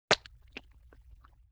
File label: Spit Tobacco; Vintage Cartoon; Comedy Vocals